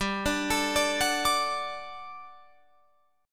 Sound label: G Major 7th Suspended 2nd G down Guitar Open F; GM7sus2 arpeggiated